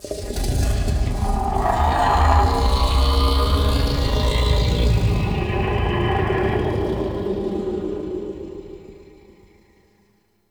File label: Growly Vocal; Ambience; Man In The Wall